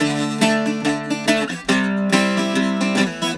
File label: Celtic Flavours; USB Soundscan vol.42; AKAI